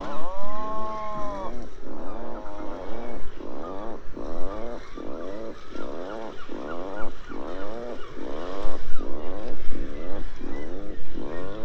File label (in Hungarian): lunda hangja